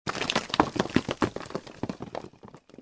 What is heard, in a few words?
dirt, ambience; Star Wars Jedi Knight II Jedi Outcast; XBOX; artus